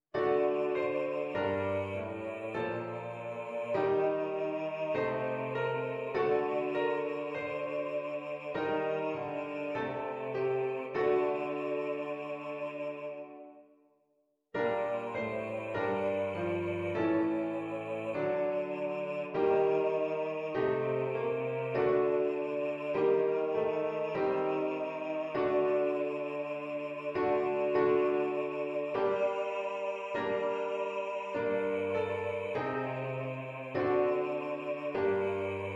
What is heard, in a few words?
Chorale Bass Cantata